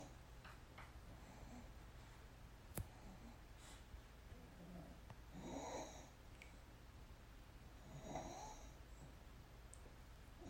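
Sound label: dog snores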